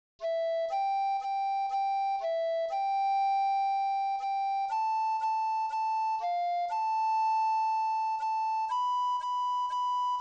recorder

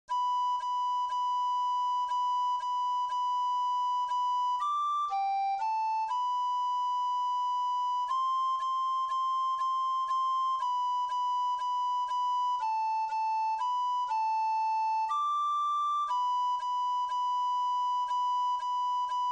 recorder solo

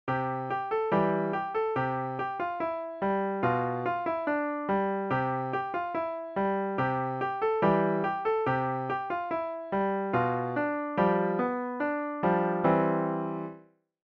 piano

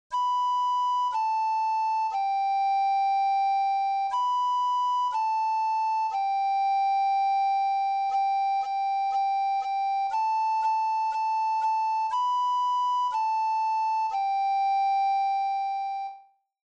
recorder

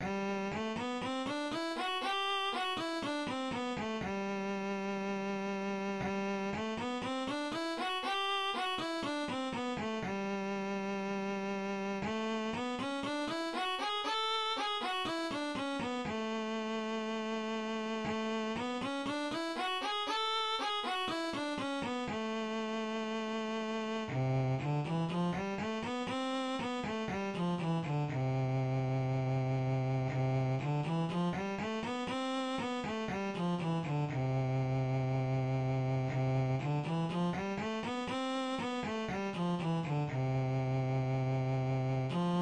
major scales violin

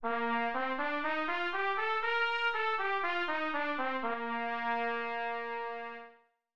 major scales trumpet

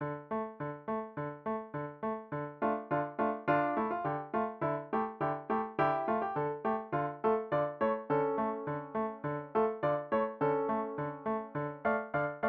dance of the cygnets piano

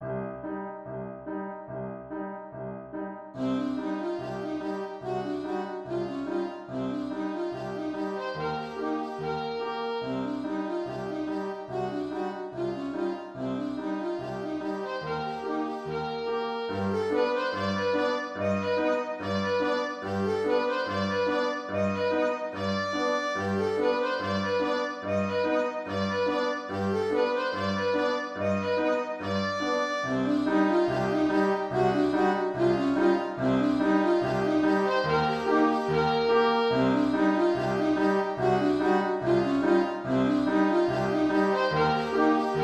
alto sax

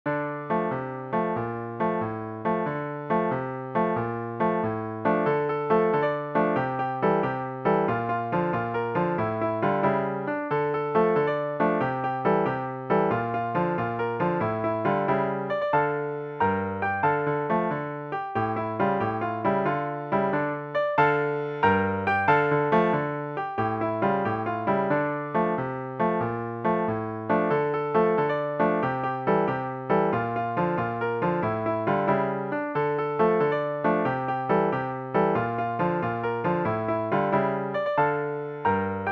piano; Easy/Level 5 Piano Solo

